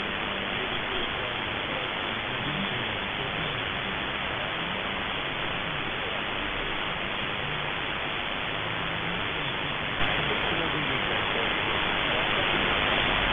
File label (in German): Piratensender NL; 6290khz; X-One-AD370